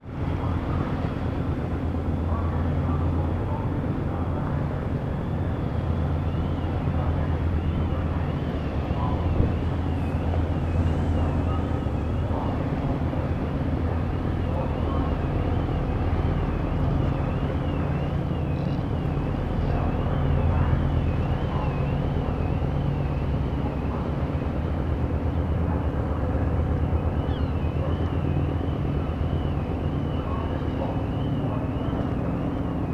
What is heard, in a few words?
transport ship car ferry passing